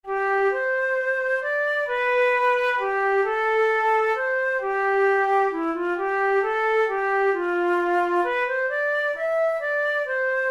bahay kubo, flute

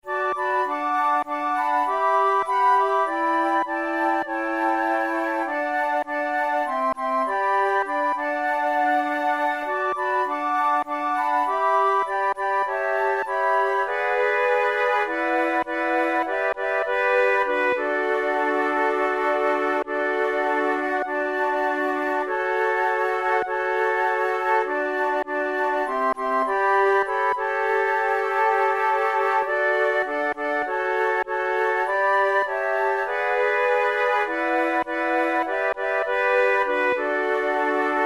quartet, flute

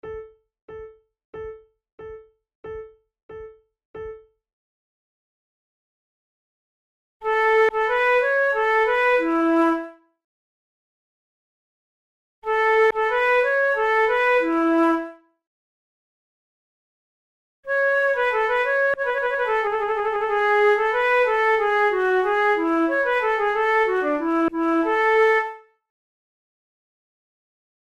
two flutes gavotte a la maniere francoise; Monteclair; 6 concerts; flute tunes

